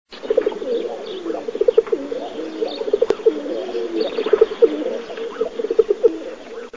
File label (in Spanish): canto paloma bravia; aves campo